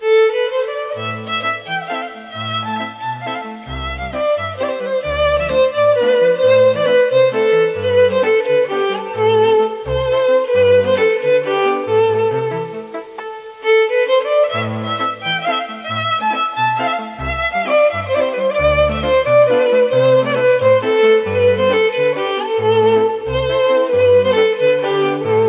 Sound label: Violin